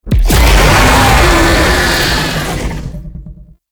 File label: Infested Vocal Phase Two Transition; Warframe